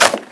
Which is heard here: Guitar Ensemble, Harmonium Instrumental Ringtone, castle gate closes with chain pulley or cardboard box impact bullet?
cardboard box impact bullet